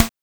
Hi Hat, drums; Sonic Foundry (Sony Creative Software); Drums & Drones; Construction Kits; Within Kit

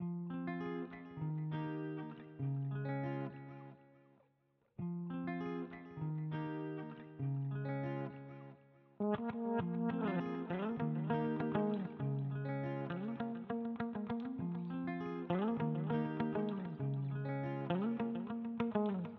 Guitar Loop, alternative; She cries all the time; 99Sounds x Monosounds; Instrument Loops; Guitars

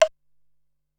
Temple Block, Drum Machines Ensoniq SQ-R-Plus; 300 Drum Machines

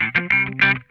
GRAINY WAH, Funk Guitar; Zero G - Funk Guitar

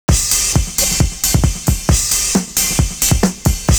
ride on beat, 133.5; Univers Sons - Kitch 70s

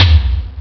m1 elo tom; ST-XX Amiga Soundtracker Sample Packs

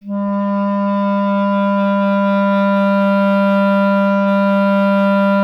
clarinet G; Miroslav Vitous; Solo Instruments VOL-1

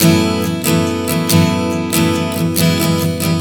Strum 070 F#m, One Chord Strumming 070 bpm; Sonic Foundry (Sony Creative Software); Songwriter Acoustic Guitar Companion

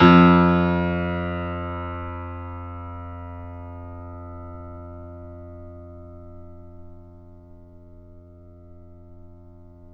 Mf F 2 off, Grand Piano off mic; AKAI S6000 CD-ROM - Volume 2

